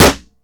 COLT 45, GUN SHOTS; 1000fx

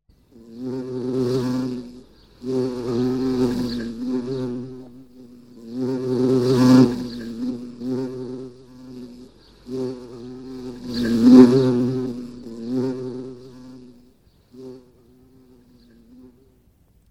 Bumble Bee; SOUND EFFECTS1

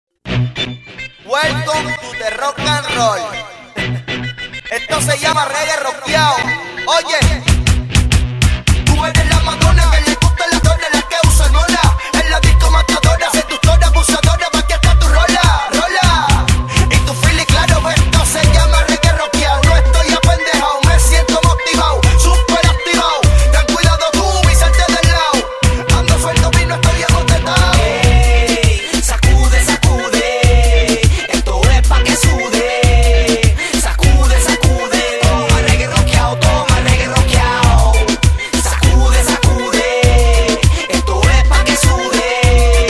Reggae

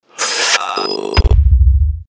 Sucking Wind; Best Of N2O Library; Stabs & Bursts

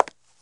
CONCRETE, FOOTSTEPS; 1000fx

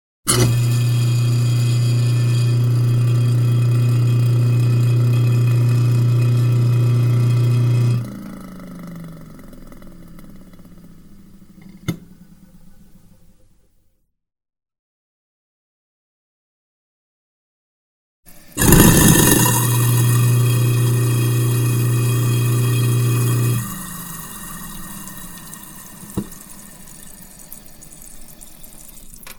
Grinding Wheel; SOUND EFFECTS1

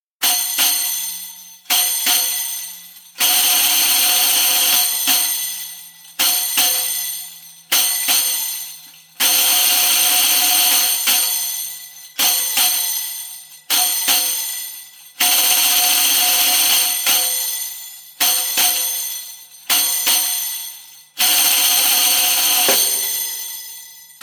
Riqq Rolls (slow); Djembolale - Fills and Drills

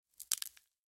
Bone Break; Hollywood Edge Martial Arts and Human Impacts

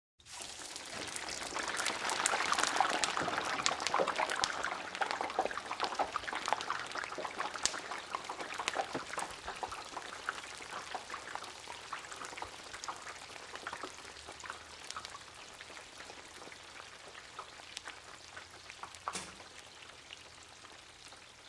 French Fries Dropped Into Hot Grease & Sizzle; Household

